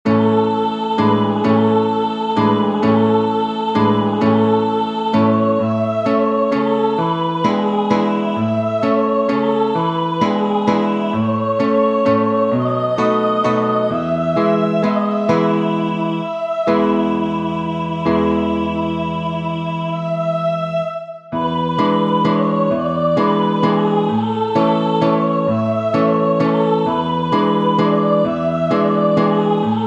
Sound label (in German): Kuhreihen, alphorns